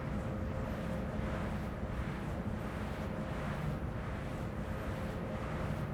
Jetpack

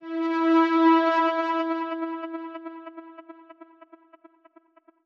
Special-Photo Impossible-Triangle Synth

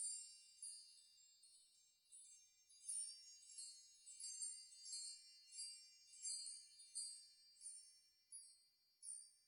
sparkle; Frogsong

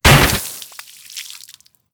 Pipe Break

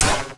eating; MiniGames; light red

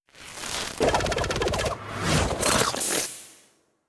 Archer Volley Attack

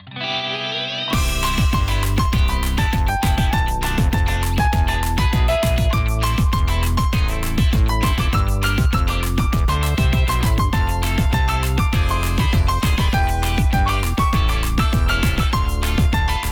B Menu Event

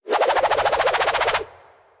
Abyssalflame Whirl

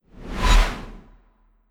Skel Drake Spectral; Legend of Keepers

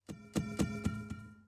Energy Ball Sound

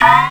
Shield Abrupt

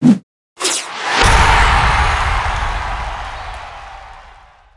Sfx American football

